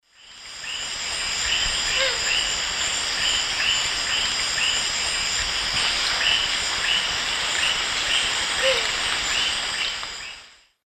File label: Cotinga maynana, calls female and immature; S. America; Eastern Lowlands